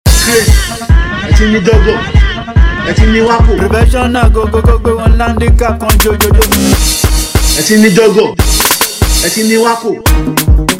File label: Beat